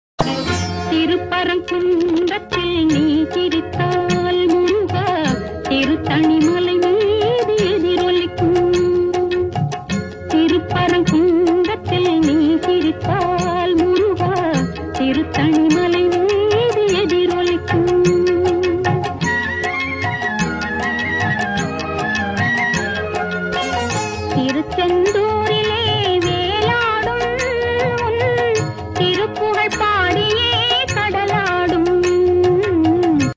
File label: murugan ringtone; thiruparankundram